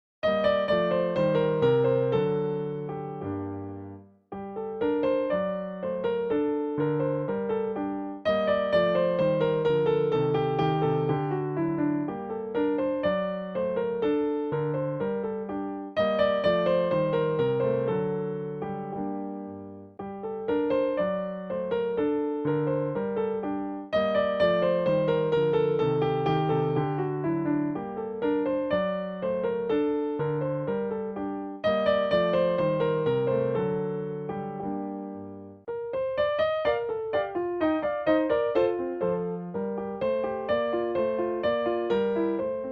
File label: Gavotte in G minor